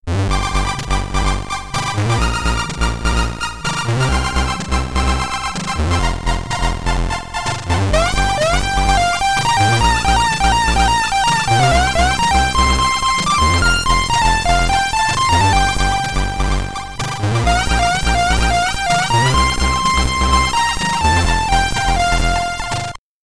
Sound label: Name Entry, SID Stereo, commodore 64; Hades Nebula